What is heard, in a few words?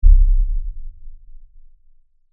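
Impact Low Frequency Effects Monster, Footstep, Tremor; Impact, Lfe, Monster, Footstep, Tremor; Horror; Blastwave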